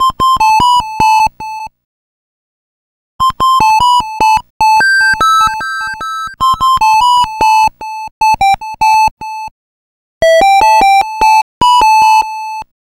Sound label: Atari 2600 Synth